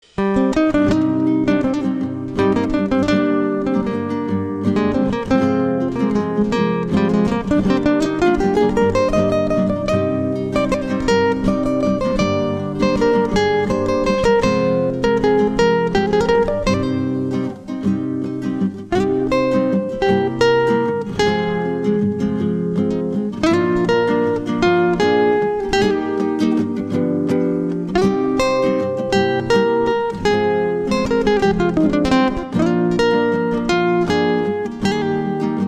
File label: Ringtone